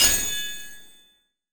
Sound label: Active